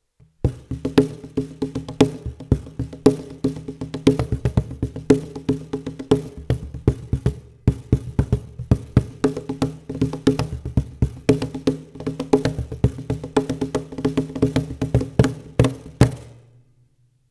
Meinl Cajon CAJ1WN-M Teppich in Halbstellung; Meinl Cajon Test

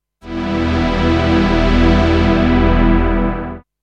Pad Release Time 1500 ms plus 50 prozent

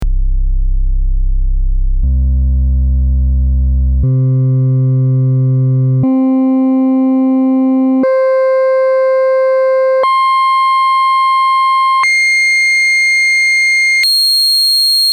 Sinus

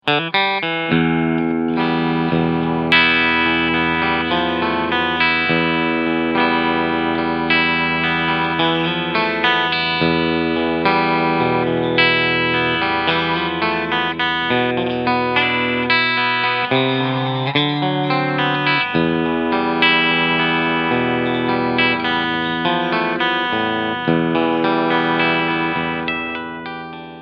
GL Tribute Asat Special Clean Sound Steg PU

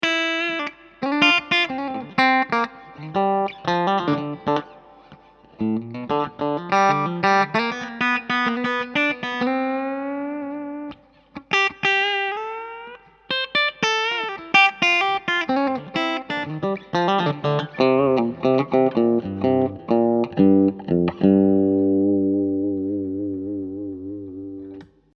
orange clean strat steg

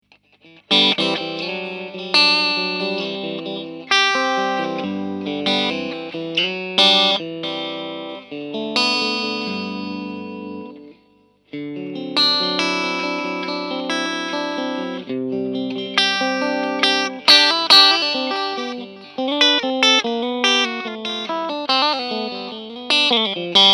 Stellung 4 strat

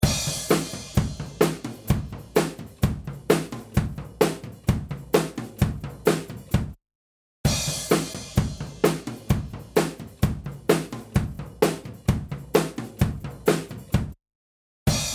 Drums komplett

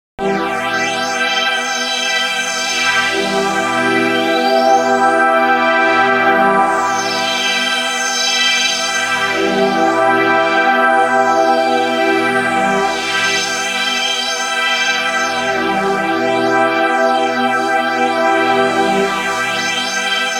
Waldorf Streichfett Plug In mit Automation

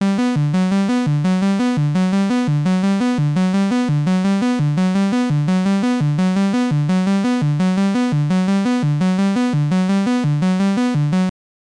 Behringer K2 Sequenz HP Filter Klopfen gleich

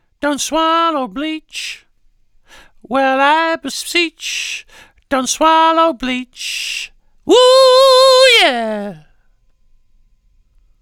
Vocals Kein EQ

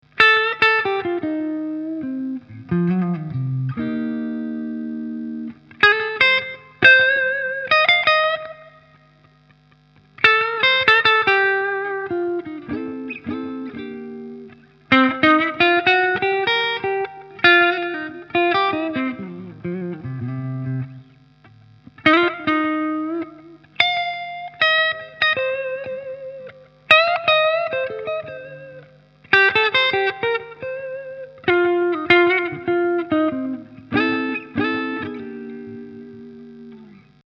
Epiphone Les Paul Custom Hals clean

